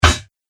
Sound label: Staff Hits Armor; Staff And Rod Sound Effects; combat